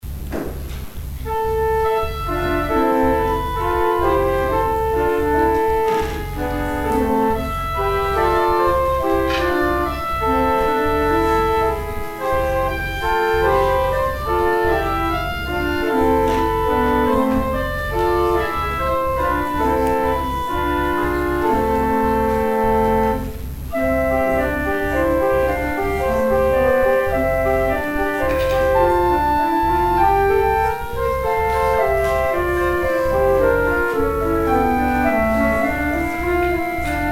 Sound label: organ interlude; Offering organ interlude